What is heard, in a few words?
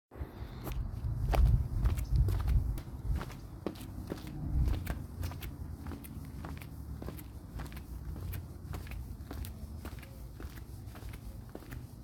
Footsteps